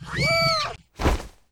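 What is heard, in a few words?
war die, Mob; HELLER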